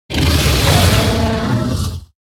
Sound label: Storm Drake Attack; en